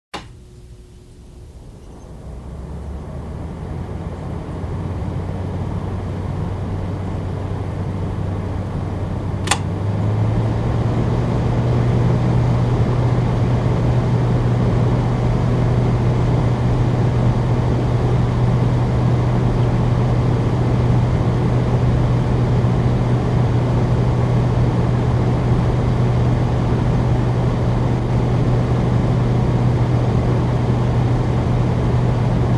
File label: Air conditioner; SOUND EFFECTS1